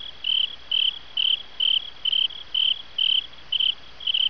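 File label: crickets loop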